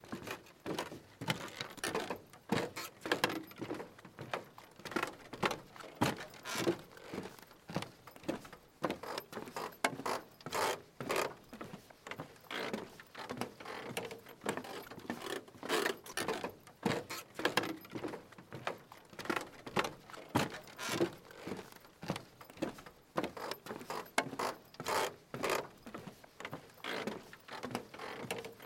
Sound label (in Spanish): PASOS SOBRE PUENTE COLGANTE DE MADERA